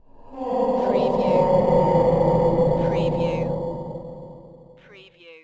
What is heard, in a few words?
GHOSTLY MALE LAUGH